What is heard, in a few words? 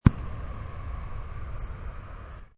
Runway Warning Shot, Cannon blast on airport runway signals plane takeoff; Airports